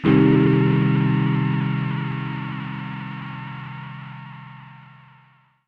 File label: SYNTH Peace Chordz; Gasious Giantz